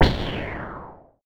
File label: cannon